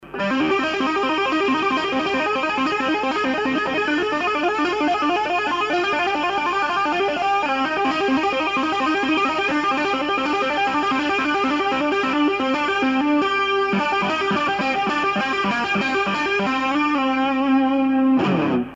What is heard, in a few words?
tapping